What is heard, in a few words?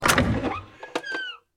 safe open